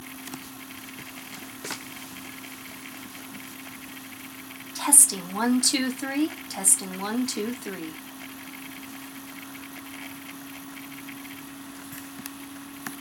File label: normalized audio, room noise